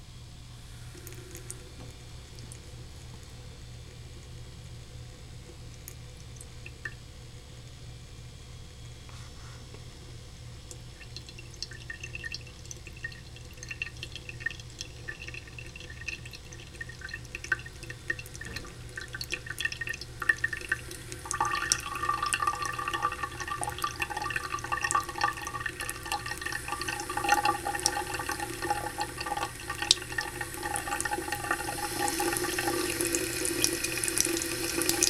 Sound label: drip to full blast water